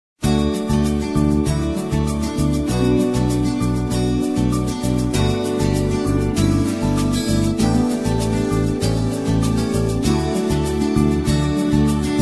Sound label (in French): version instrumentale